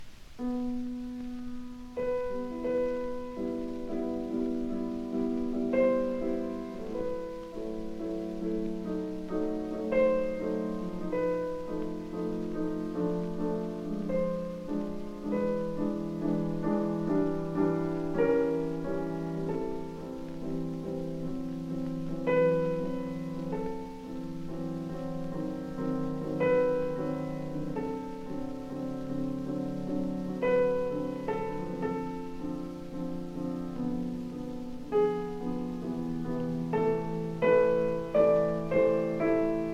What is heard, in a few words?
Prelude in E Minor